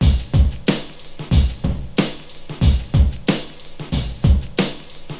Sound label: east coast drag hiphop, drum loops and breaks, 92 bpm; 091 to 100 bpm